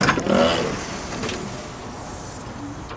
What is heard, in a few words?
Hospital Door Open A, pneumatic